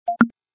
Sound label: Notification Ringtone; Vivo X100 Pro